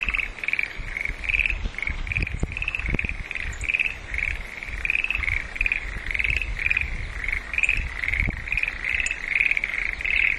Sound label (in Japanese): kawazu